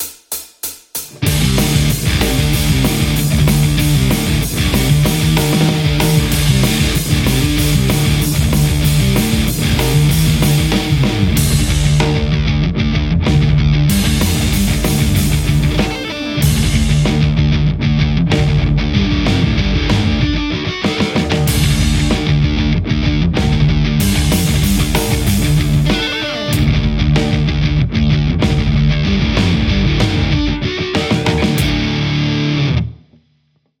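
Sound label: metal Stratocaster; thunderverb200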